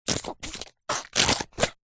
cartoon wet rubbery movements; zapsplat

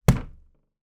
door wooden internal bang hit; household; zapsplat

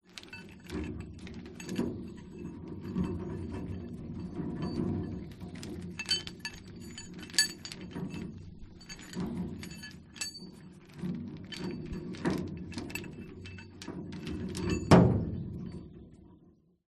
industrial chain on metal turn spin

